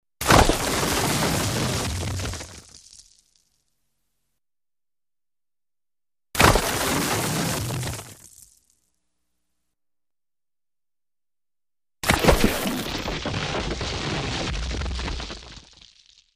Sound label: Landslide Effect, rocks